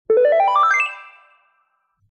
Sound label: level